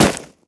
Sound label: step run, footsteps player debris